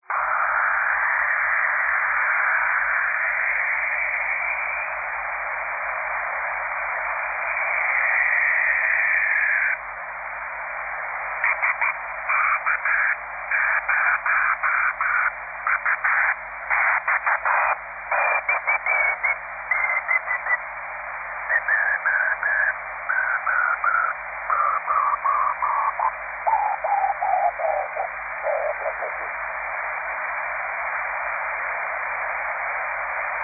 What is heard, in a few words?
47G rain wind tuning; 47GHz propagation